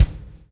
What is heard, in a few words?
PULL, Backbeat; Spectrasonics - Backbeat